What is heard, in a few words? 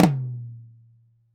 Drums Acoustic, RAW KIT; USB Soundscan; AKAI